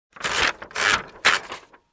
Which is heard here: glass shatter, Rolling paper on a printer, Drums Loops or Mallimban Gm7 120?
Rolling paper on a printer